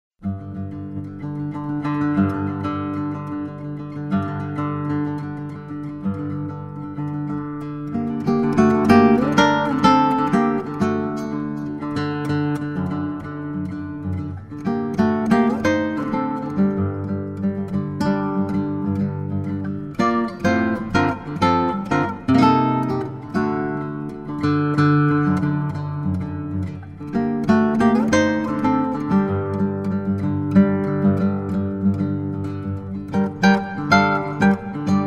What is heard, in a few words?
holiday atmos